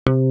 GUITAR 130 BPM delay; SONIC ELEMNT; SONIC EL YES

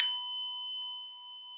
GLOCKE, GLOCKEN; SoundBytes - Early Patches

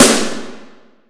Snare Verb EQ; Yamaha PSR E305

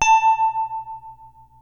PPG A4, synthesizer; Hollywood Edge; Giorgio Moroder Rare Synthesizer Collection; PPG.2.3

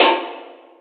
Wah Oneshot; Sonic Foundry (Sony Creative Software); Esoterik Beatz

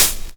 HH OPEN

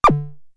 DF-500 Misc, Drumfire

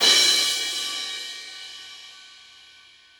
Crash Average, Lounge Single Drum Hitz

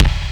DMX kick; Greytsounds; DMX KITS

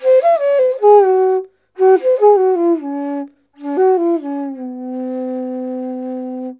INDIA ALTO

